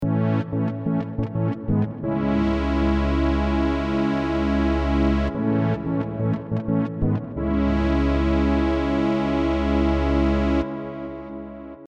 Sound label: Brs solo